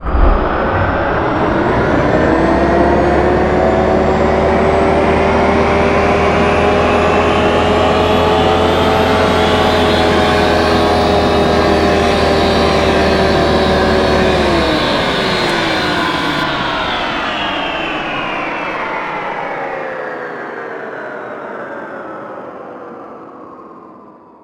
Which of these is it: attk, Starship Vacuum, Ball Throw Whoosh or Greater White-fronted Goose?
Starship Vacuum